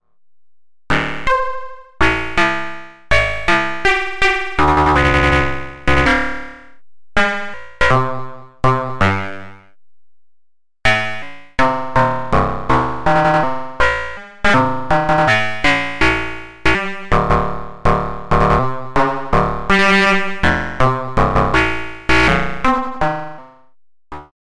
Pittsburgh KB-1 Arpeggio Mehr Unwahrscheinlichkeiten

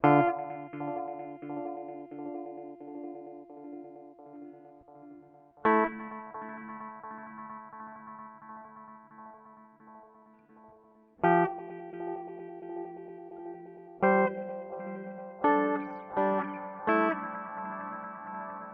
Meris Polymoon mit Phaser

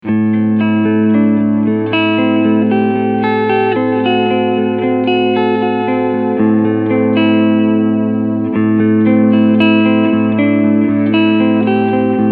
Clean Picking beide PUs

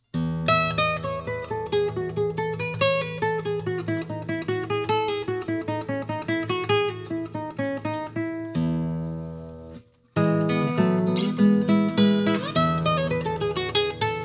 Klassik

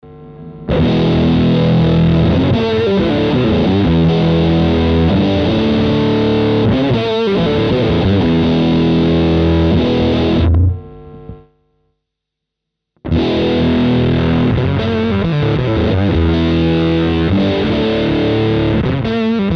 EONS Mode V Gain 12 Uhr Voltage Test

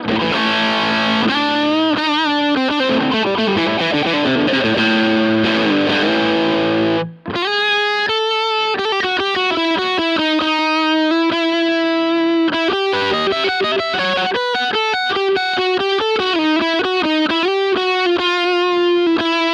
Harley Benton DC Junior Fat Zerre

